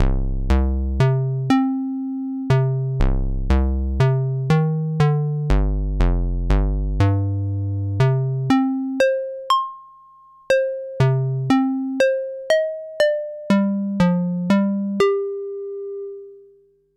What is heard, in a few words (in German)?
SH-101 Rechteck mit Filter Env